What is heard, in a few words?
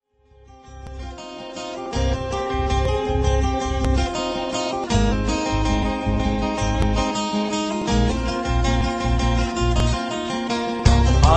acoustic ringtone; heinz